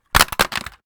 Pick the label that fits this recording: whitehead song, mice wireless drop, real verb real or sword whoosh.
mice wireless drop